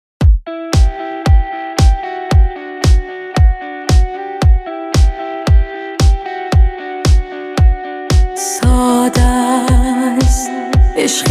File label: deep house